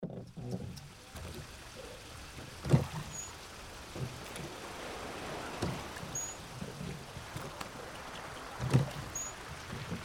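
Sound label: Rowing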